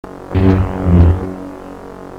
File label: sword draw; csco; csgo; weapons; sfsword